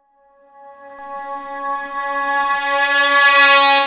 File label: suntur, stringed instruments